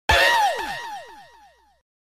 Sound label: bow Notification